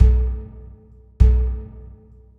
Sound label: Fat Cave Box, processed percussion; Sonic Foundry (Sony Creative Software)